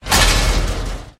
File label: Shield